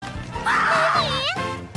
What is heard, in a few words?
Screaming